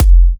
Kicks F; markus hakala

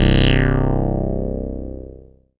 SYN DIGI, SYN MicroWave; Sound & Vision - Gigapack I CD 2

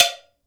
cowbell; AKAI S6000 CD-ROM - Volume 5

